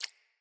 Rat Water Step, Foley

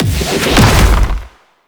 Ultimate Focus Operator Cast; Unairu; Ultimate Abilities

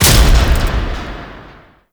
Blast Door Open; Ambience; Warframe; TheNewWar; Zariman

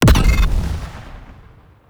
Glaive Detonate Explosion; Warframe; Glaive Ghost Catcher

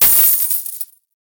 Fist Claw Coin Impact; Weapons; Warframe; Tenno; Incarnon

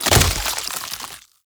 Tree Seed Splat; Ambience; Gameplay; Warframe; Infested Eris

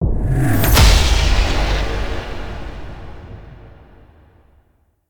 Rank Up; Kuva Lich

